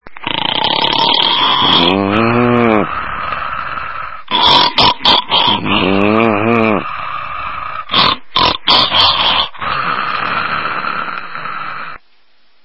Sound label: snork